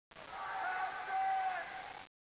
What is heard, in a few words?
dist shout